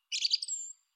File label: Bird